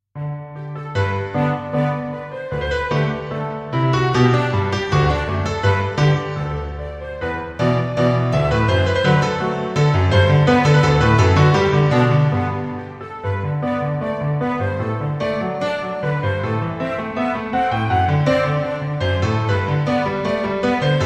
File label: Orchestral Version